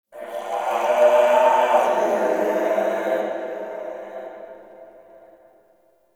Covered Vine Area Tube Scream; Ambience; Misc Outdoor; Warframe; Infested Eris

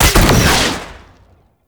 Grenade Launcher Shot; Weapons; Scaldra

